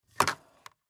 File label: Telephone Pick Up Cord Bounce; Telephones; Electronics; Technology; Blastwave